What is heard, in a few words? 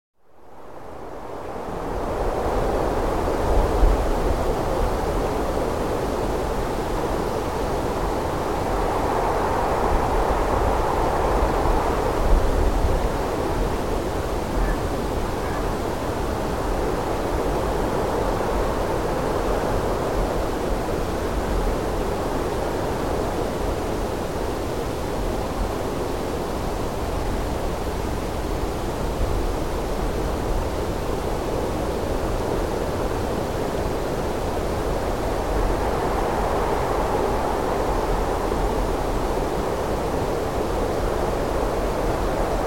Light Wind Cold Cold Birds